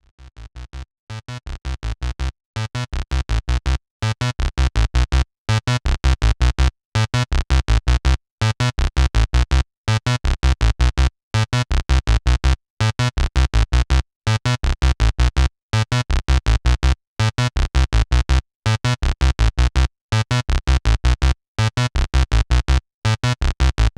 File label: SH Pulse Width; sh101; sh101 sample pack